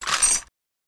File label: Chain kick